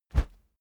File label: whoosh wood cornered soft; swooshes-swishes